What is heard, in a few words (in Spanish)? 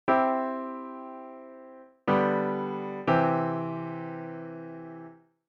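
Cadencia Auténtica Imperfecta, C; auditivo de cadencias autenticas plagales rotas y semicadencias; Identifica la cadencia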